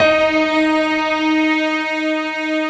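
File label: D#4, PIANO STRINGS; Best Service; Advanced Media Trax Modern Composer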